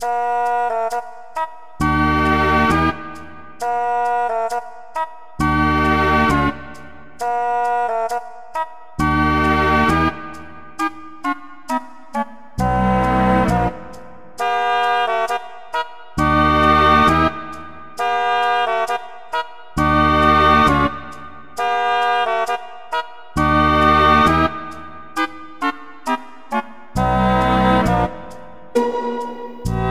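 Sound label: background music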